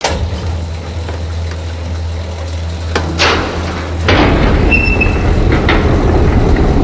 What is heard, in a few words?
airtight gates start; device